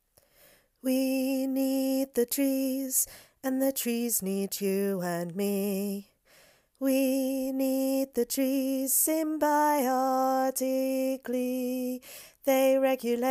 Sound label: tenor